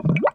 menu bubbles

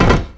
iron land

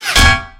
tank hit; War Thunder; sfx tanks

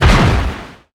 damage hits explosions

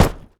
dirt run

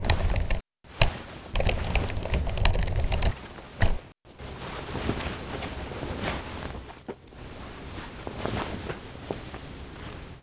laptop sit idle calm; Modern Warfare

